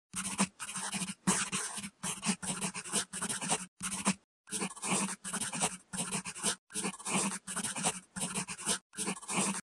Karandash

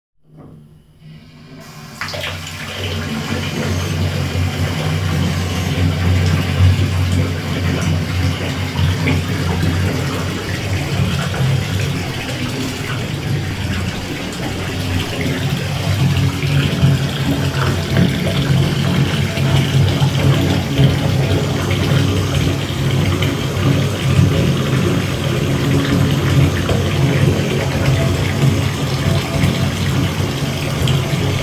Vannaya

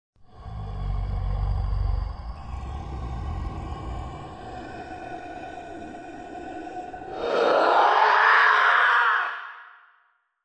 Zombi

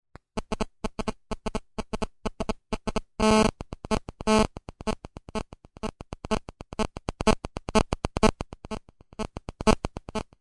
Pomehi ot mobilnogo telefona

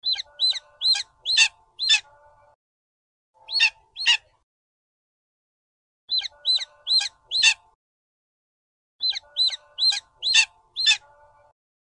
Kanjuk

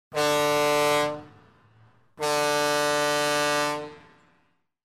Tifon

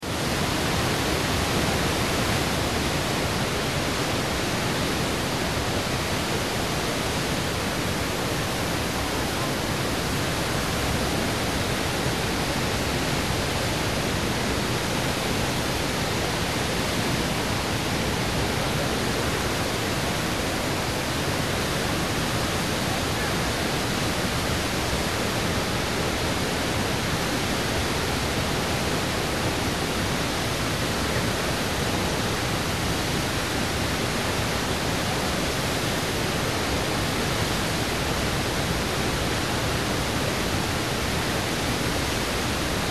Vodopad Niagara

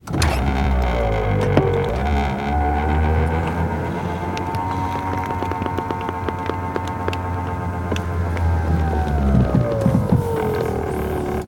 Kabriolet opuskaetsya krysha

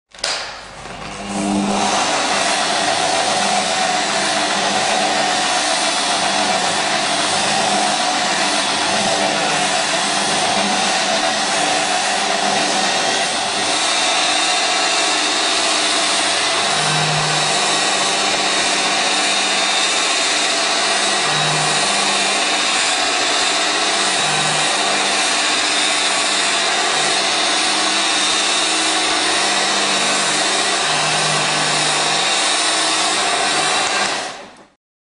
Polirovka

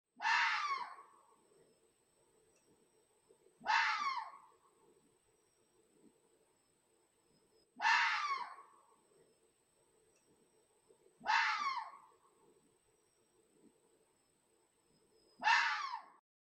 Korsak

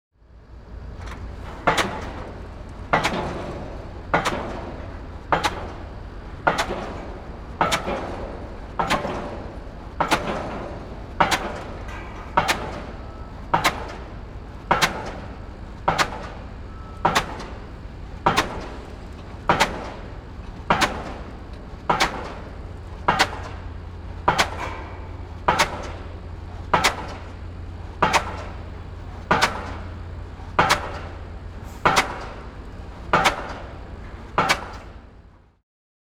Svaeboinaya ustanovka

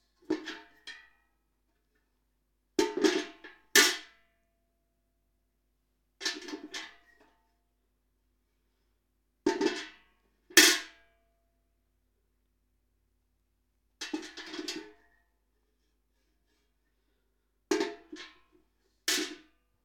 Vedrom gremyat

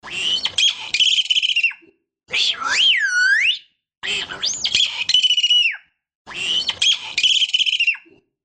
Svyaschennaya Maina

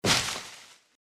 V listvu upal predmet

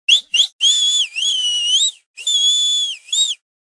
Razboinichii udaloi svist

